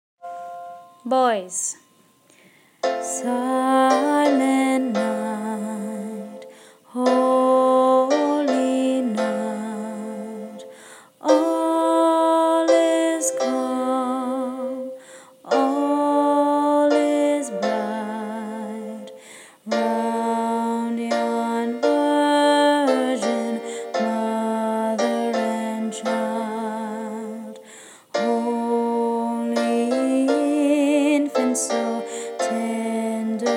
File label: silent night tenor